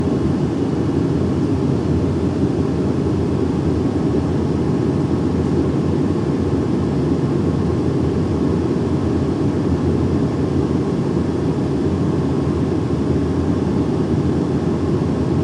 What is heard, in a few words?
airplane ride headphones